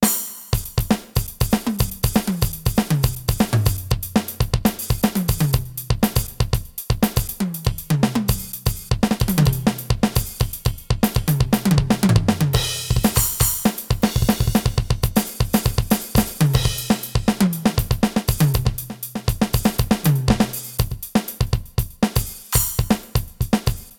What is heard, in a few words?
Korg S3